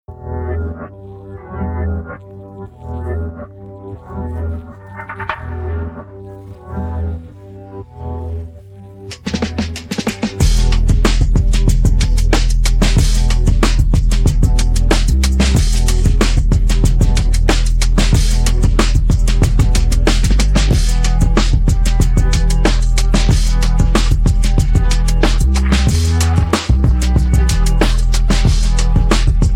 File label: instrumental; persian